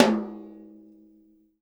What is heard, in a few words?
TOM, Drums Acoustic; USB Soundscan vol.10; AKAI; 90s sample CD